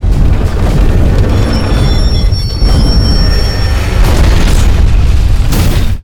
clockworks die; FlyForInsanity

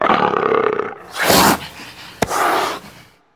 Npc Dumb Bull idle; FlyForInsanity

